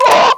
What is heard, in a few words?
recharged; player